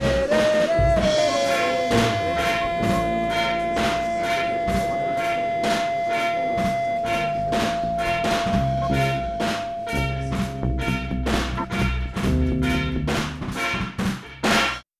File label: reggae